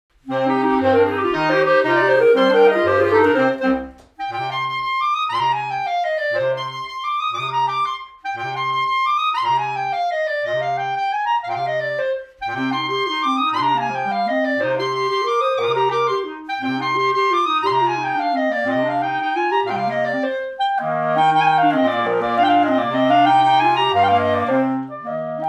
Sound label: Jig Polka, clarinet